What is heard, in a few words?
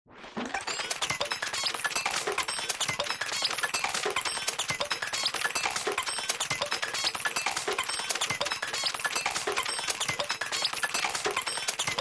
Cartoony Robot Works, beeps & tones